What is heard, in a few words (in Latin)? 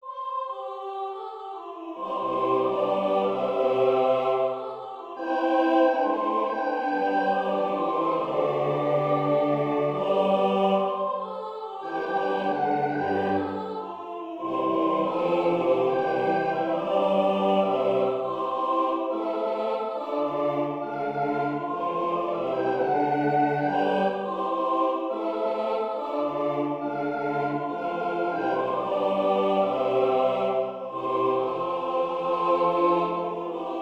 cantate domino; bouzignac guillaume